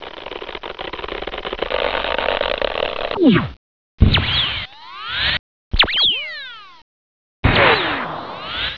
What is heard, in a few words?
pencil